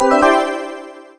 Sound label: BONUS; Sonic R; Windows